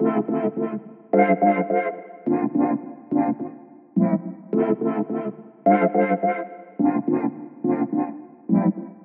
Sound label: 106, kit loop vibez synth bright lfo chords, A min